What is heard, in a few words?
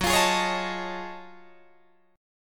Gb Minor Major 13th Gb down Guitar DADGAD